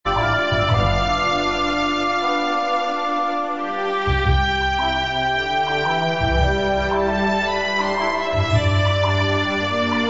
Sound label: Along Western Country